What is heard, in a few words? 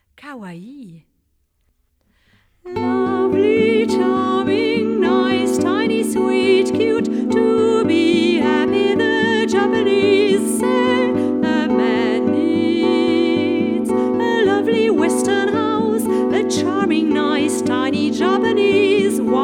Kawai